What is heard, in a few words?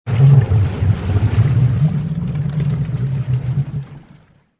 liquid drain